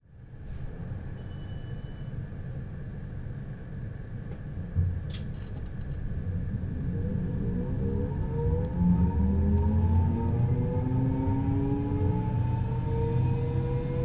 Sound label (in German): Fahrsound S Wagen beanspruchter Motor